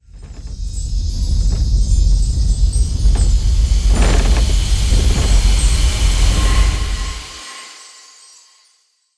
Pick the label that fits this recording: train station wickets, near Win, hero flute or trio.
near Win